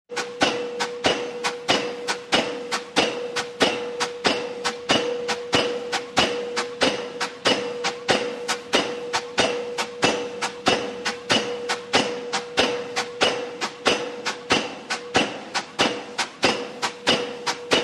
Pile Driver Steady, MACHINES - CONSTRUCTION & FACTORY; EXT: Steady & rhythmic impacts with metal